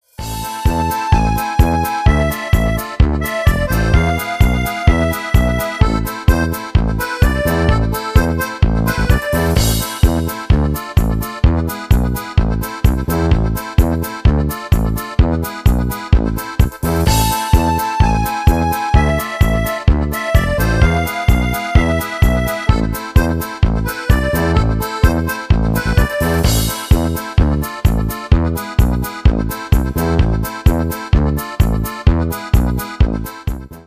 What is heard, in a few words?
instrumental